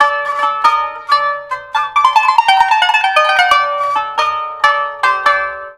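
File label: KANU, Oriental; Best Service; Ethnic Journey Sound Cube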